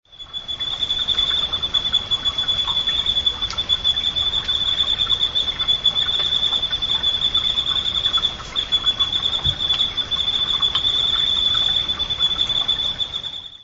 ryukyumatumusi call; yanbaru

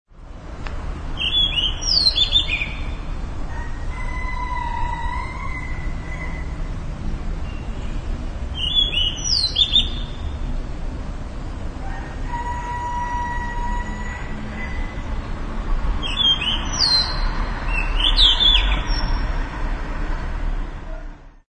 isohiyodori call

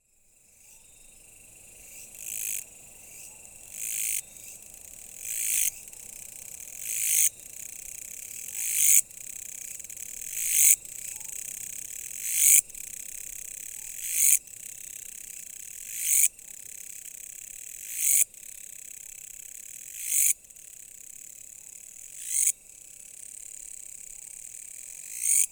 taiwankutuwamushi call; yanbaru

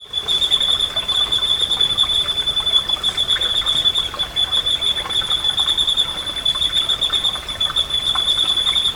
ryuukyuumatumushi

